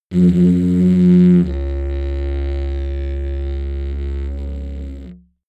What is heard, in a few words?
didgeridoo long attack